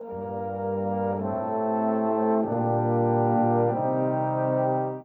Brass; Sonic Foundry (Sony Creative Software) - Orchestral VOL-2; Modern 36

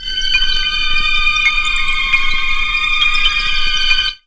ICY CHD

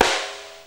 SIDESTK, SNARES; Back In Time Records; Big Bang

